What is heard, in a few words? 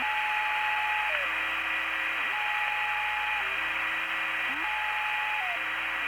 Static Noise; 1944 Battle Of The Bulge